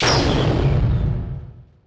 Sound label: Attack Impact; portal quest android; Mother Nature